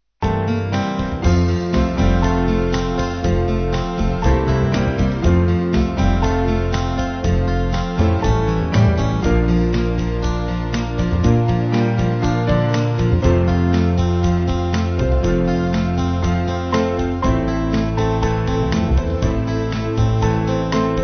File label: Thank You Jesus, Band